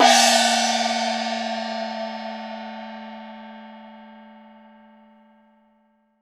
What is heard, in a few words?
DARUO OPN, PERCS-KIT; INIS - Opium